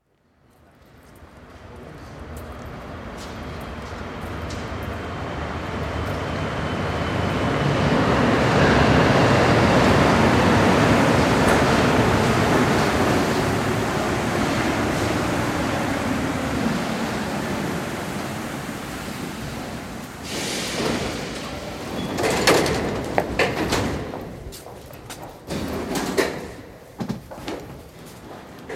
Trains interior, train arrives at station, board train, intermediate stops, disembark, train; France; board train 24, intermediate stops at 1'30, and 2'35 disembark at 3'46; BBC